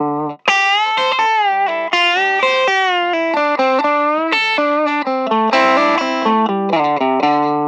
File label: Guit Solo